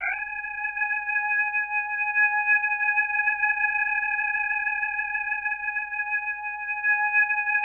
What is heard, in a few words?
ORGN 123, Keys; Houseworx